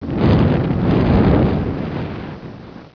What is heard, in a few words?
burn